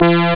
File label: Brass Ens; ST-XX Amiga Soundtracker Sample Packs